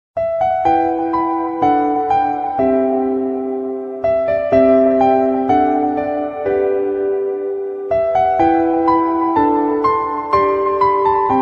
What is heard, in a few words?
soft piano ringtone